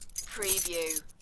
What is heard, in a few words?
Key ring movement